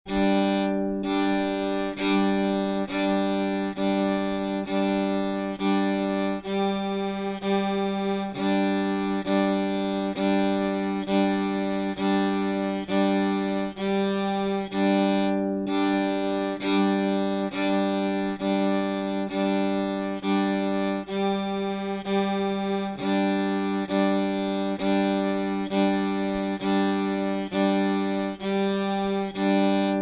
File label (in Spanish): base melodía